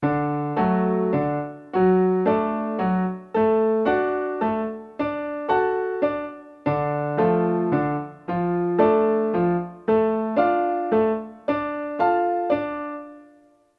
triad and inversion rhythm in D and Dm

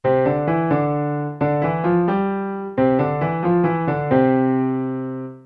triplet in c

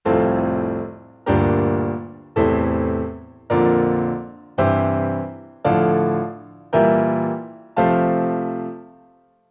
F Major diatonic chords 2nd inversion

